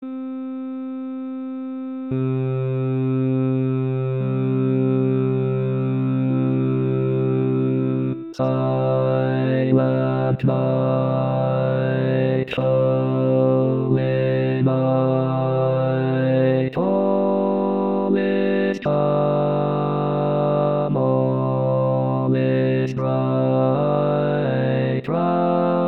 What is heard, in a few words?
Bass